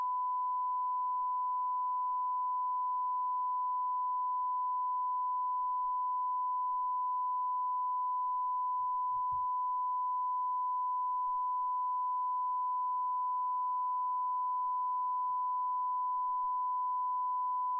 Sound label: Calibrator on BK2260